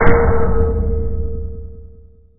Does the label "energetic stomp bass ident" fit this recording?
No